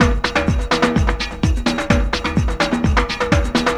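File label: TRIBAL LOOP, Techno Trance; E-MU Formula 4000 Series Vol. 2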